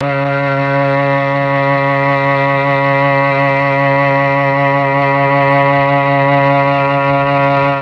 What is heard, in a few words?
C#3 TRON CELLO; Mike Pinder - Mellotron Archive CD